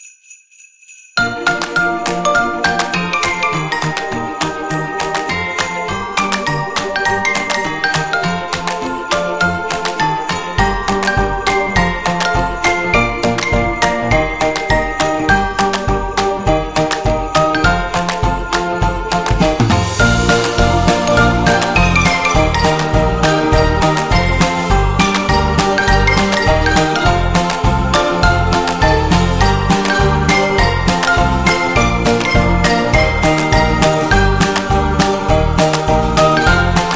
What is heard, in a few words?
For Christmas